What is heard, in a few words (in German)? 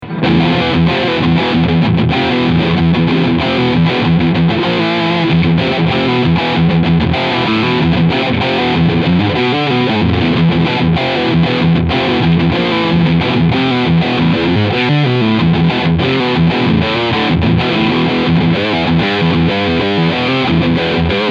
Overdrive Riff Steg Humbucker; Dean Guitars Angel of Deth